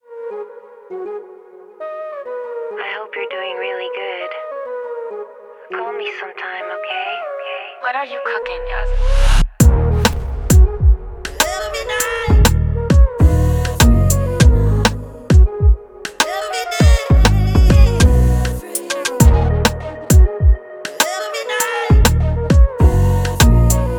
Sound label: Afrosoul Type Beat